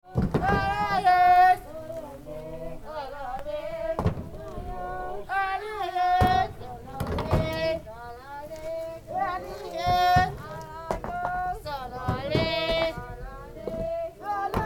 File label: Ladakh India Lorry Loading Worksong